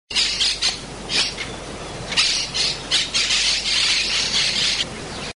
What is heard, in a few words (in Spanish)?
voz cotorra